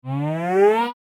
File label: Line graph rising animation infographics